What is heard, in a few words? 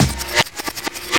Reverse Break; Jungle; Breaks; Blu Mar Ten - Jungle Jungle - 1989 to 1999 Samplepack